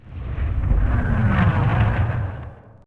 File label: fly by